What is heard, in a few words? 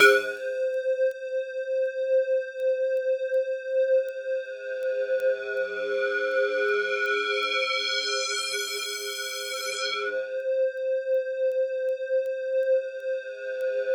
C4 FM, synth line; 90 s Sample CDs; NiceBeats; SynthLine VOL-20